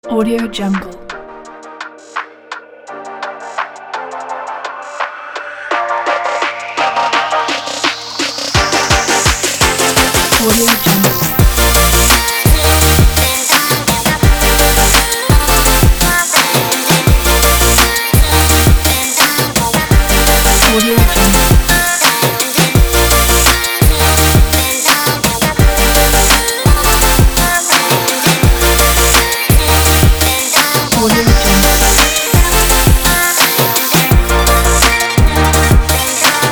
summer electronic music

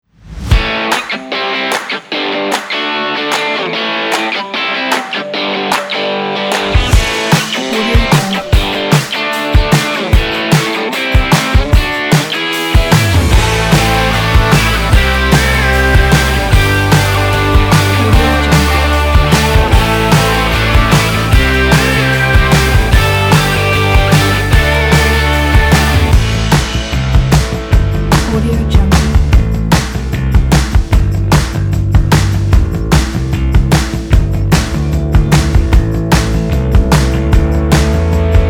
indie rock

